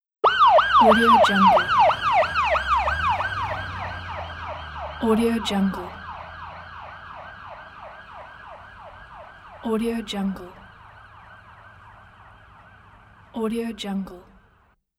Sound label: police car siren